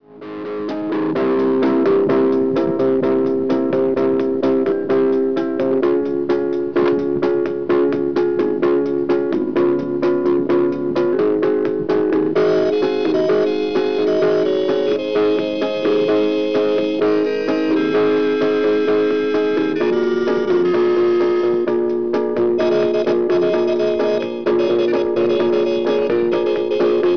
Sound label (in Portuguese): ritmada